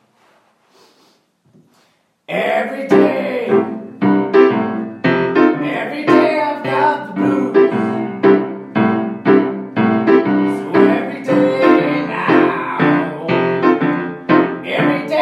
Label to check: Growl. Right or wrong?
Right